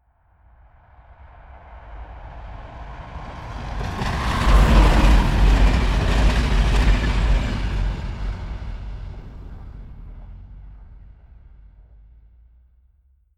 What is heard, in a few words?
train passes